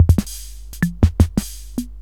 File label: Casio CT-310